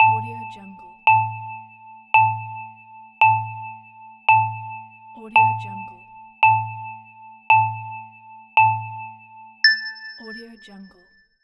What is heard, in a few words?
countdown; fx-market